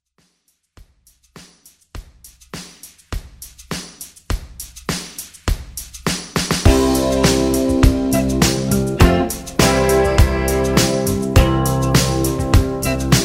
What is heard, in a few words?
80s Pop Country Redrum